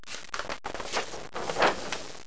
FOLDER

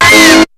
LOUD SHOT; BEAT2000

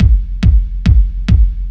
DRUM, HOUS-TEC DRUMS; MAGIX

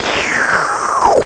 SLURP, HUMAN

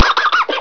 COUGH

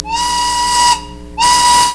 LUNCH WH; BELLS

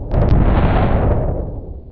EXPLODE

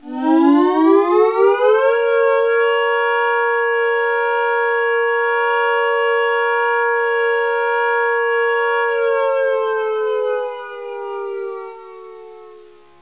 AIR RAID

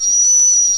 whistle

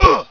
GRUNT M; ROADRASH

